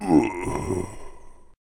zombie death; natural spawner; mobs

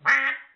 ducky moaning; petz

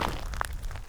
gravel footstep; pedology

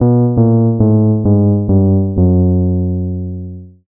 jingle defeat; minetest; block league

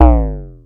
hovercraft bounce

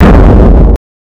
boom; zombietest

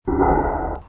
ghost hit; creatures

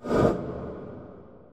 ghost; zombiestrd

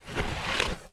rustle bag; prestibags

